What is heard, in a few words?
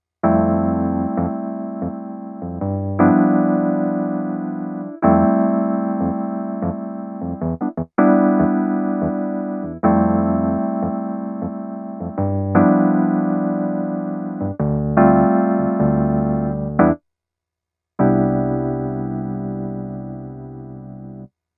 Casio PX S3000 60s Amp E Piano